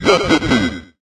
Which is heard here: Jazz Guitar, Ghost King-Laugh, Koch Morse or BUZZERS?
Ghost King-Laugh